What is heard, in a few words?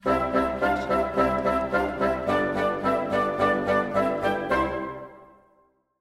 Friendly Ident Woodwinds; SoundsCrate